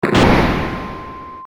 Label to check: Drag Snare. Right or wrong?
Wrong